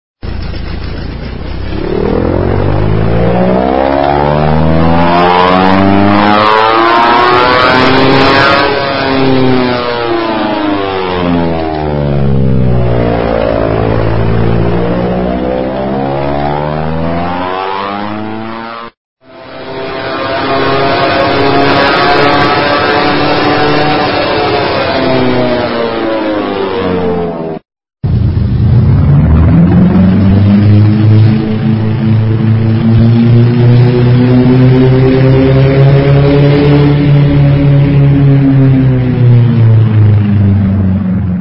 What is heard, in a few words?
WWII Aircraft